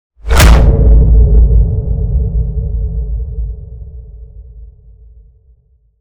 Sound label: impact howl; Virtual Riot; Impacts